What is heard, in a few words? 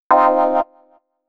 125 Clavy C, Keys, Nu Jazz House; PowerFX - Nu Jazz House